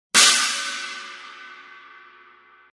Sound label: mini china, china cymbals; sabian; hhx evolution